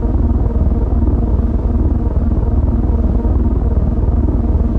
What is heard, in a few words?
drone; sven